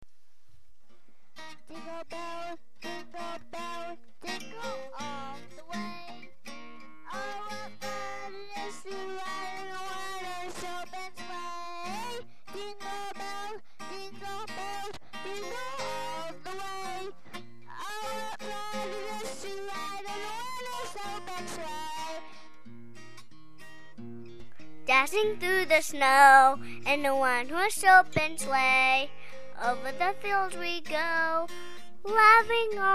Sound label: Jingle Bells